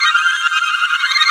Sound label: FIREFLIES, PIXIES; Spectrasonic Distorted Reality 1